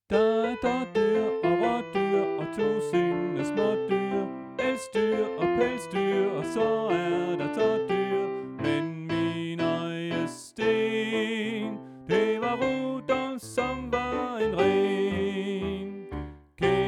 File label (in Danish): sopran 2; Stephans-koret; oevefiler